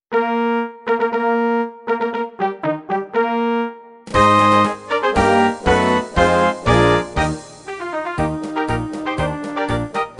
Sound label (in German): Marsch